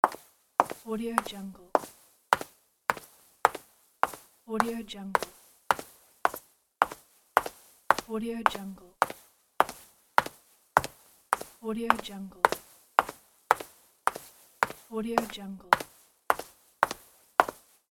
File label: female footsteps in heels; کفش پاشنه بلند; human sounds